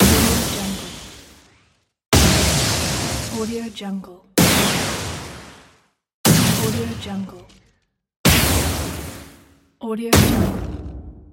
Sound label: lightning spark hit